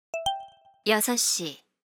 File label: Hourly Alert; SKY IM-U680L